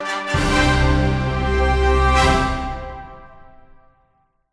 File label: Victory; superman returns; xbox 360; Metro